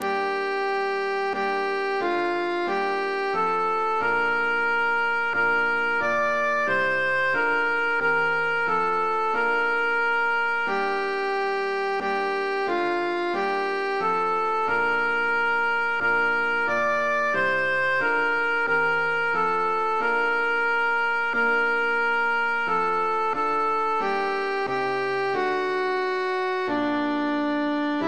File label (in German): sopran; belle qui tiens ma vie